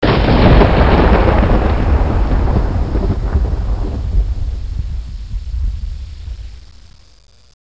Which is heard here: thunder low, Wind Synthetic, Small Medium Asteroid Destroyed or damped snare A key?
thunder low